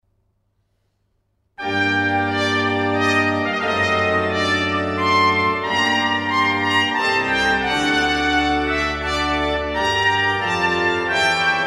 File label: Minuet, orgel; Sonate III g-moll Op. 1 V. Minuet; orgel hitzkirch